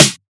studio acoustic snare sound c sharp key, Royality free steel snare drum sample tuned to the C#